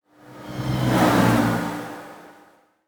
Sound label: Car Passing By Whoosh; 快速驶过2